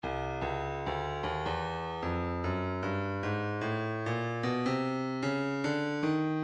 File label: breakbeat 075 bpm; Stylus Breakbeat Midi Files 075 079 Bpm; The Plant E